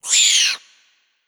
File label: Rats